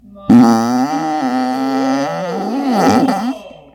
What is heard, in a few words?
smelliest fart